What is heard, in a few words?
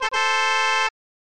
Car Honk